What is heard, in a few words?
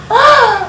moan gasp